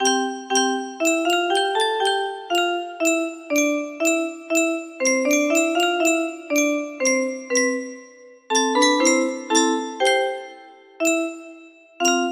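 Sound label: music box melody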